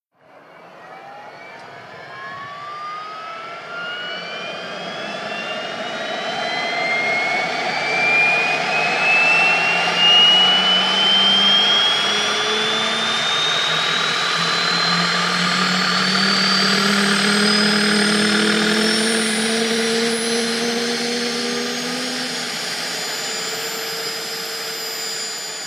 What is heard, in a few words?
Gazelle Strt Idl Lift, aerospatiale